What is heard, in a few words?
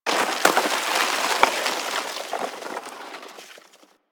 Landscape Ice Slide Medium, Ambience; Warframe; Venus Open World; One Offs